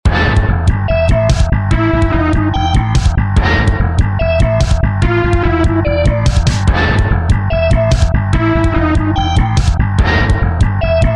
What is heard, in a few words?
Music Boss; Megaman Flash